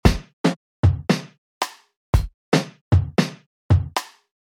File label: creating a basic drum pattern; ampedstudio; How to make a beats